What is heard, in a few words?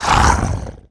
move